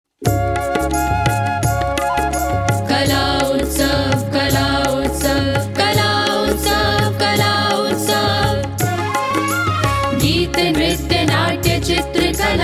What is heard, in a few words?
Jingle; Kala Utsav 2023